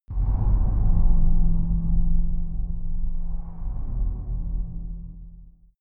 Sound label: far dark metal rumble, hits impacts